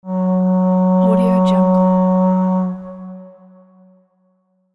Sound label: ship horn at harbor